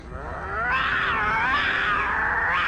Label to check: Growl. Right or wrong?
Right